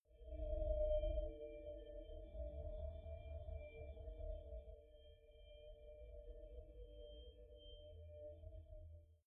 space scary forest chirp call

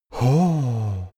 male sigh impressed gentle; menschen; koerpergeraeusche

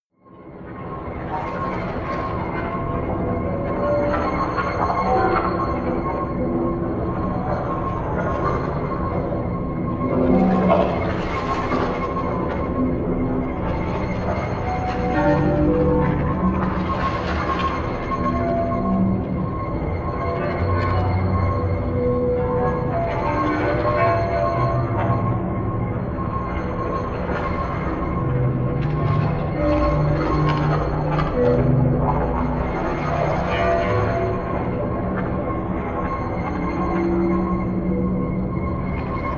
drone atomic scrape

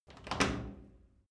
wood door old lock

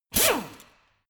impactor short high; maschinen; bohrer

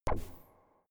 space laser gun bubble shot hard; soundpool

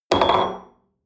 espresso upset table medium, restaurant cafe

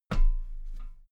step metal ladder step top soft

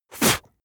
spit blow; koerpergeraeusche; menschen; soundpool

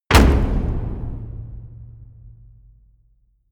hit wood, hits impacts

